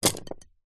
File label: wood skewers handful drop on carpet; zapsplat